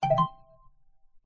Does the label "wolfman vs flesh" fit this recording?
No